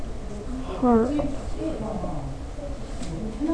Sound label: harp